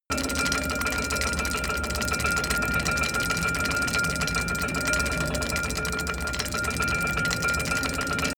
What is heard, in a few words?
robot movement walk roll